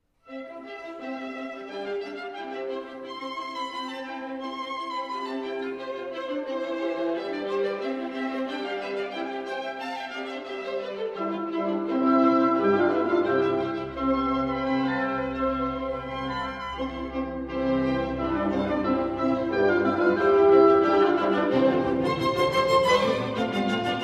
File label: Concerto For Flute, Harp & Orchestra in C Major, Rondo. Allegro